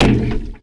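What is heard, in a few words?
ARTSH HYBR, percussion metallic; Rarefaction; Poke in The Ear With A Sharp Stick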